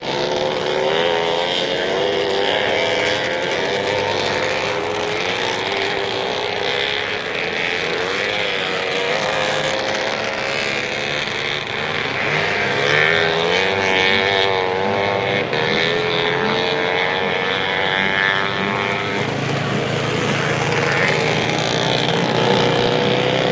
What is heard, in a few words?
Snowmobile Races Around, Multi Vehicles, Go